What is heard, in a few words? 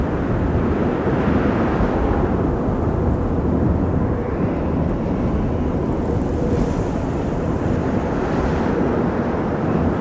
Sandy Border Wind Quad; Journey